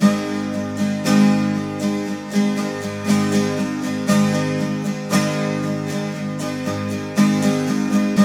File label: 12 STR MAJ A, TWELV; Spectrasonics; Hans Zimmer Guitars VOL-1